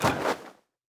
Banner On

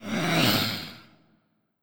Skel Soldier Strike Vo

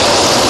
Sfx Winter games

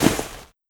Cloth; Scum Cloth6

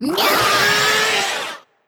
Mermaid Death VO; Legend of Keepers

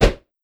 DROPS

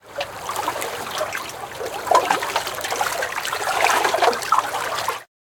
Splash; AmbienceCommon; TsuriAmbienceSplash6

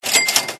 Buy; Burnin' Rubber 5 HD

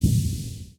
Projectile Impact

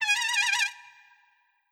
Trumpet; Trumpet R Eso B2

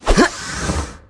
Wizard M dash

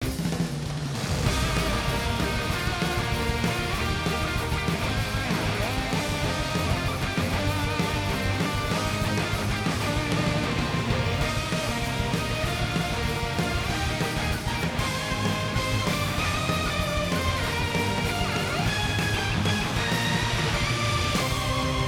Event Suspense Heavy

